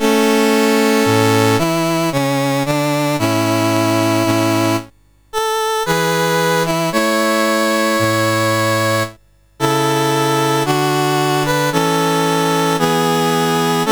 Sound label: Estonia, National Anthems, CSG8580R5